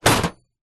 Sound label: industrial tool box metal old medium down